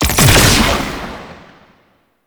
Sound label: Drop Beam End; Enemies; Weapons; Warframe; Tau; Sentient Dactolyst